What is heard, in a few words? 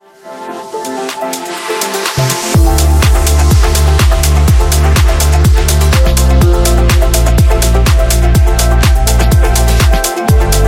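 Queue Circuit, House background music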